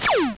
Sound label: bullet; vectrex; vectroids